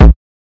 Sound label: Rubbed Kick Drum, Hip Hop; drums; STYLE KITS (Hip Hop, Etc); Khezie Hip Hop Kit